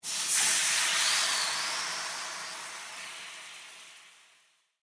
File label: Household Sizzle Frying Pan Short, kitchen; SFX Bible